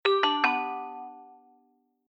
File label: charger Removed, ui; ZTE ZW20 Verizon Gizmowatch 2